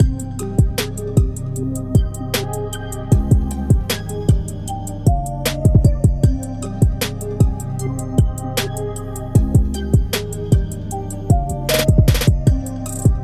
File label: helium trap loop